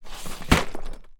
industrial tool tidy plastic drawer close tools inside; sound effects one